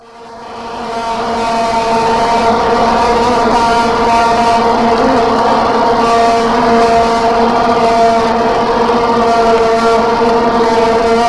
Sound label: vuvuzelas; galaxy